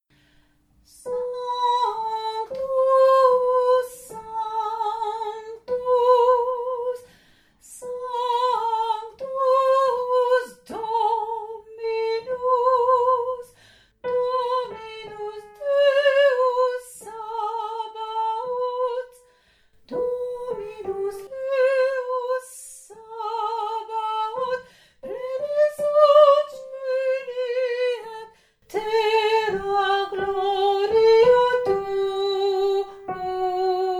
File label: sanctus voix